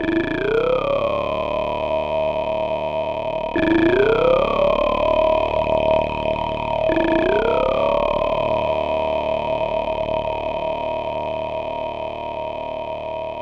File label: mirror music LOOP GAPLESSLY